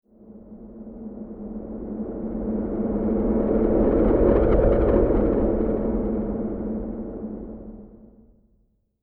Whoosh Transition Resonant Low Rumble Breathy Distortion; Trailer SciFi; Sound Design; Whooshes